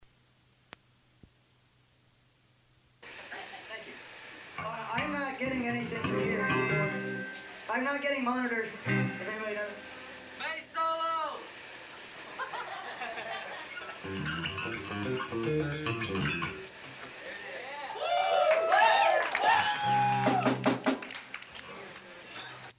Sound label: Bass Solo